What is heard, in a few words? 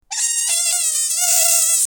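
sizzling grills monkey squeaks; outdoors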